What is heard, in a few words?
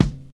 high quality clean kick g key